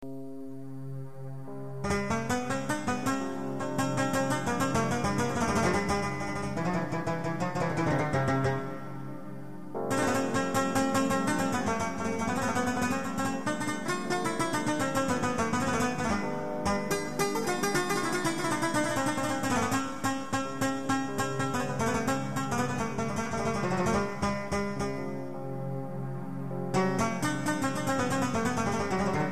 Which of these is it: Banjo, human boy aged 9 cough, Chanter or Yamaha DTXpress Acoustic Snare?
Banjo